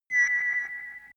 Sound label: warp preparation signal